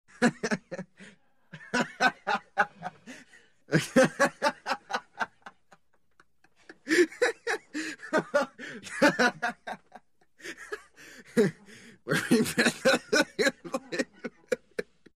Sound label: Male laughter